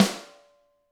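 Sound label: GRETSCH snare rim, Drums; ILIO; Double Platinum Drums 1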